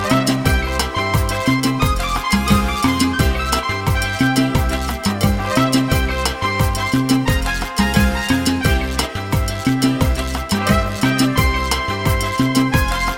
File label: Timba